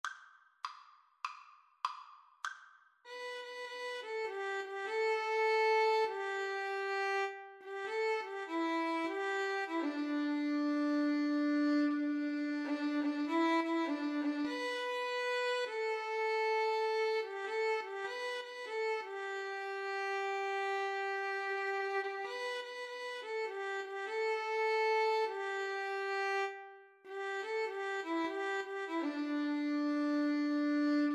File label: midnight special, Violin Cello Duet